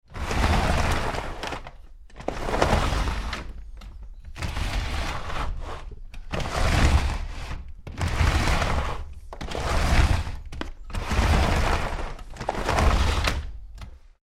Roll Top Desk, Rolled open and close several times; Tom Hutchings